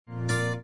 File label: asterick; Frog King